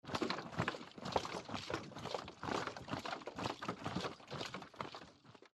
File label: Marching; Age of Empires III; cinematics